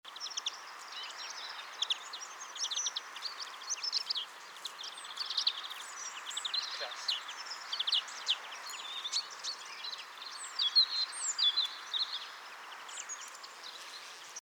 Chloris chloris; SETE

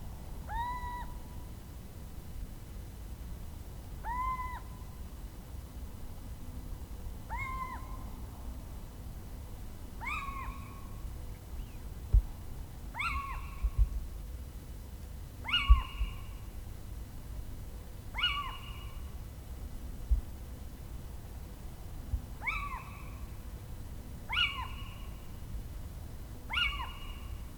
Athene noctua, chant

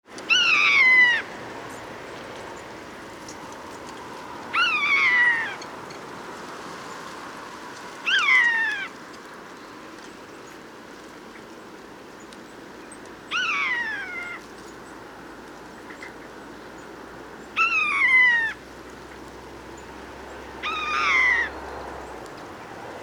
Garrulus glandarius; SETE

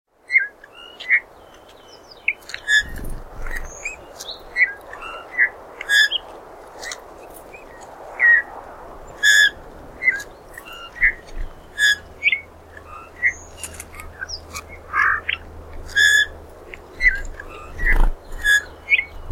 Pyrrhula pyrrhula; SETE

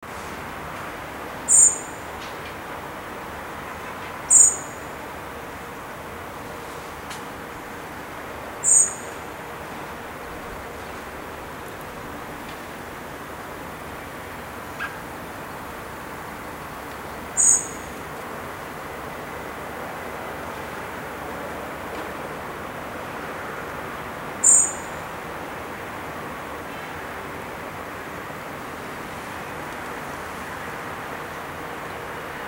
Turdus merula; SETE

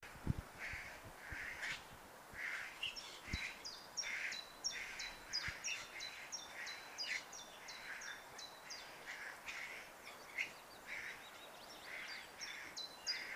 Garrulus glandarius; SETE

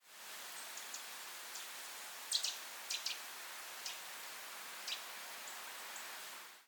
Sitta europaea; SETE

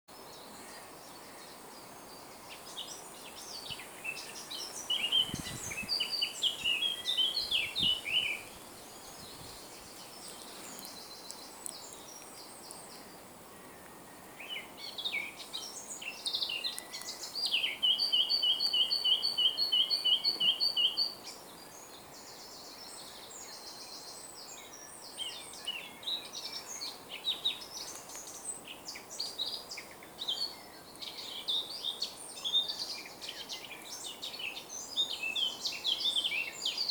Sylvia atricapilla; SETE

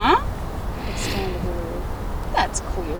noisy

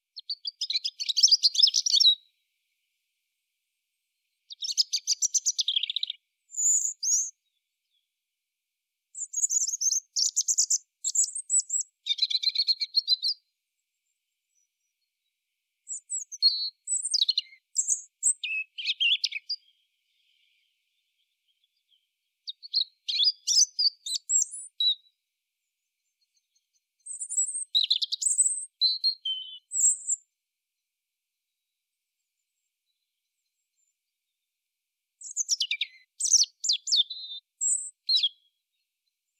Erithacus rubecula; SETE

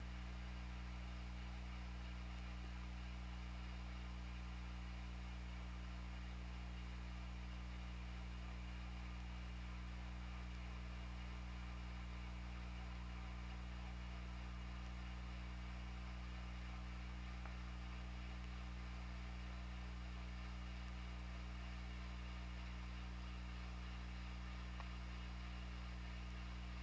Orca; OrcaLab

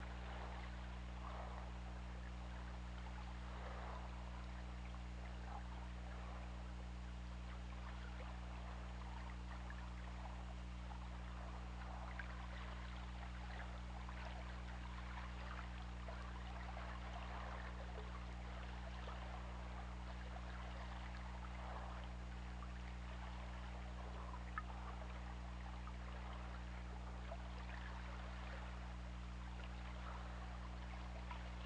Orca; OrcaLab

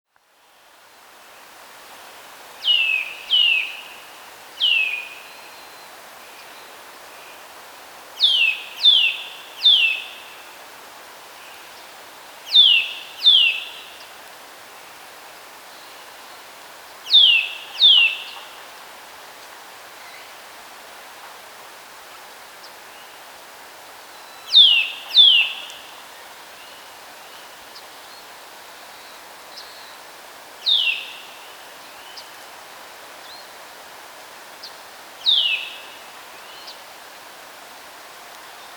Sitta europaea; SETE

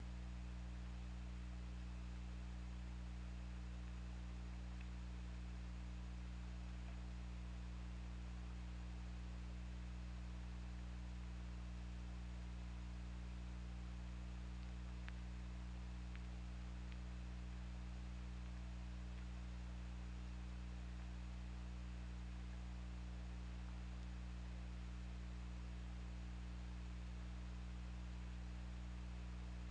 Orca; OrcaLab

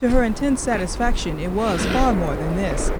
noisy

